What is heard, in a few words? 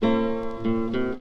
Vinyls Impulse Large; A vinyl wave file including a scratch which has been removed by interpolation; digitising vinyls